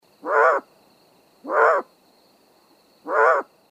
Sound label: Owl Great Horned Alarmed Adult